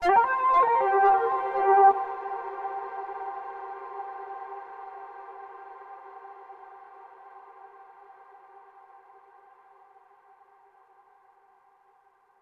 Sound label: Overlay Dark; Benefactor